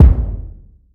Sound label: fluffy bass drum f key